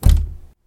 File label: crack; ludum dare 46